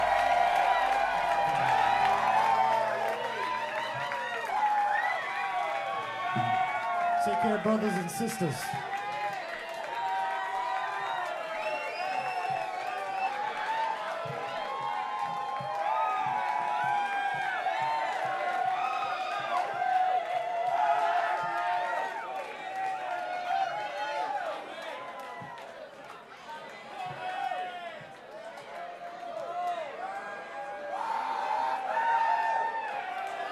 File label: Encore Break, crowd; The Bluebird Nightclub Bloomington IN USA